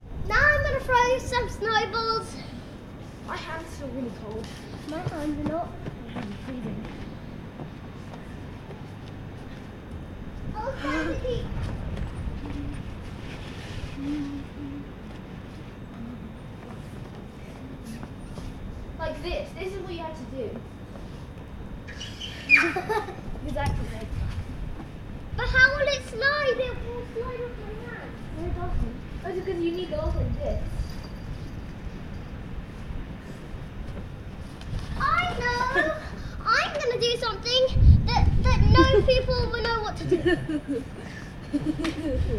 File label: Kids in the Garden, recorded with outside microphones